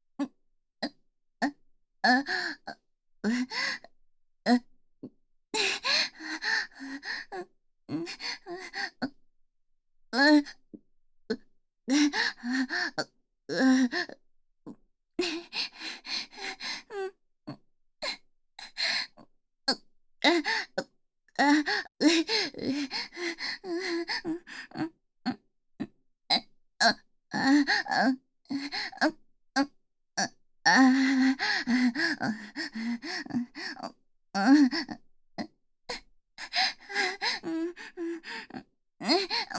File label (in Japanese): オホ声; SEなし